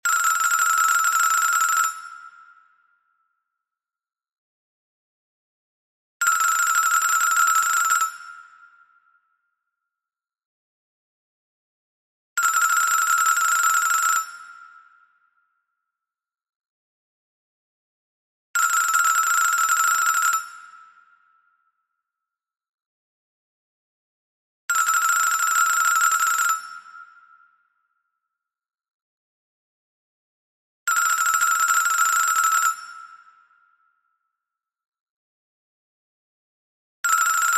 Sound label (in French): Telephone britannique